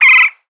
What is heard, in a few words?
Gull